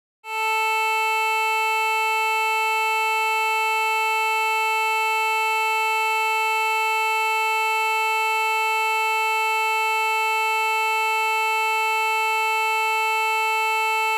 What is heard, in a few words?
A-440 tuning reference; dbx test